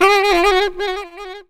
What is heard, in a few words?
SAX RIF; E-Lab; X Static Goldmine 2; akai; SAX RIFF2